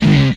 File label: Electric; retro arcade